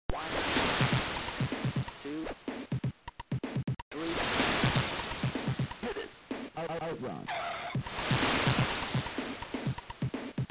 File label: c64 real hw; c64ii